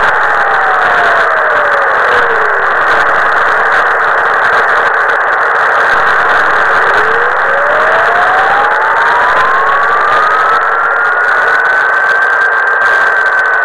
possible milsat downlink 249 553 mhz shifting carrier